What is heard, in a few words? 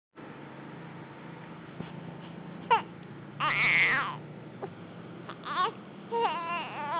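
baby hungry, female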